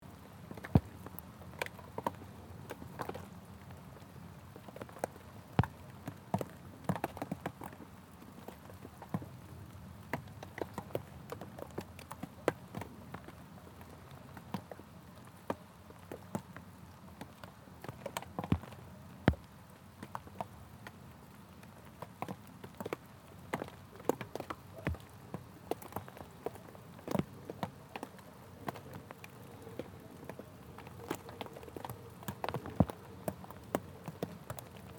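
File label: winter snow hits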